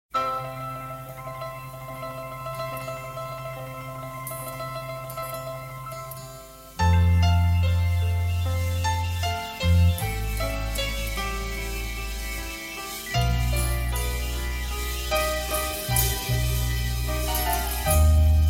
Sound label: Old Song